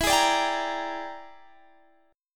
E 7th Sharp 9th Flat 5th Guitar Half Step Down, chord